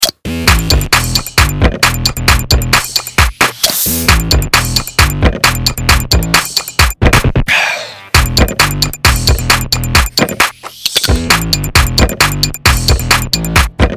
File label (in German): Wasserbeat